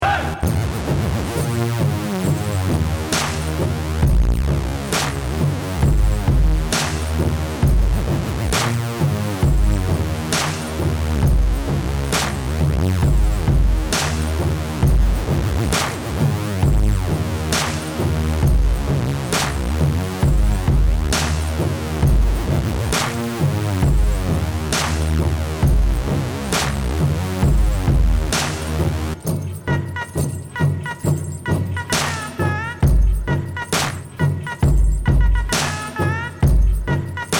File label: electric pow wow drum